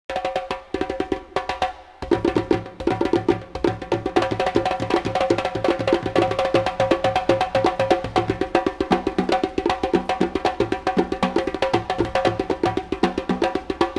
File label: djembe; arte africana